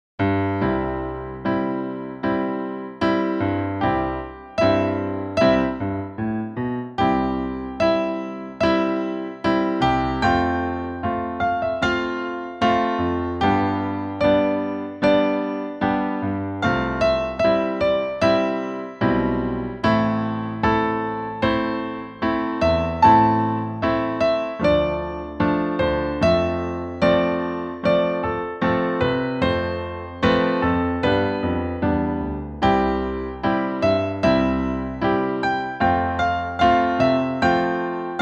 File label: solo for gent melody